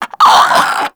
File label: Infested Crawler Hear Sound; Warframe